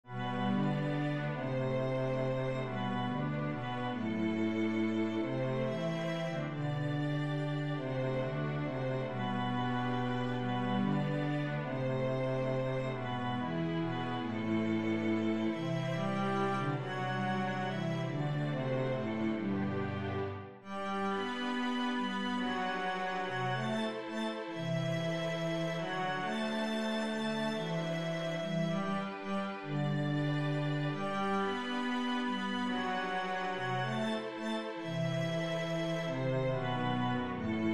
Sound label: waltz, cello